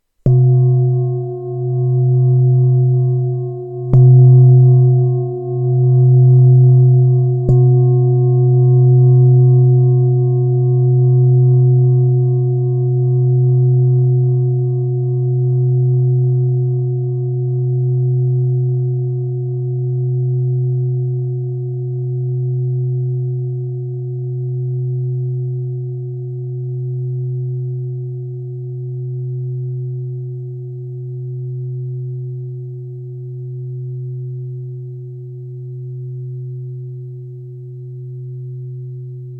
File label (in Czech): tibetska misa; b2; 23cm